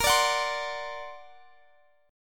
D Augmented 7th Bb, guitar

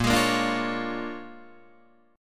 Bb 7th Sharp 9th Bb Guitar Open D, chord; 8,11,8,8,8,11

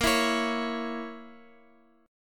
A sharp Diminished Guitar Open F, chord; strummed; JGuitar

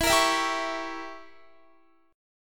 E Diminished 7th E, Guitar; Edim7; strummed

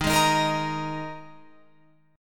Eb Suspended 4th, Guitar, Open F; strummed; 15,15,15,17,18,17

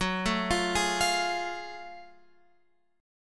F sharp Minor Major 9th F sharp

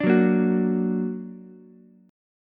A sharp Augmented, Ukulele; A#+ strummed; 7,6,6,9

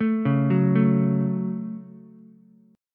F sharp Minor, Ukulele; arpeggiated; 2,1,2,0

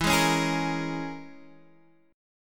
E 6th Flat 5th, down Guitar Open F; strummed; chord

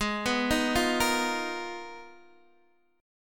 G sharp Minor 6th Add 9th, Guitar Open D

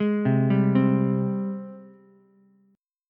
Ab Major 7th Ab, Ukulele; AbM7; arpeggiated; chordshape; JGuitar

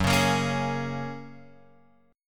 F sharp Major Add 9th; F#add9 Chord {2 4 X 3 2 4} strummed

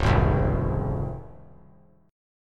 Db Major 7th Db down; strummed; JGuitar

